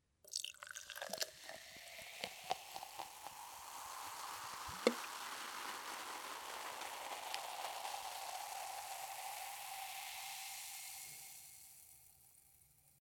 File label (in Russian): zvuk gazirovki